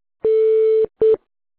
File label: beep silence, music on hold